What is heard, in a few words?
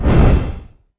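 Rocket launch fast; Hovl Studio; SFX Battle sounds; WhiteMan Unity2D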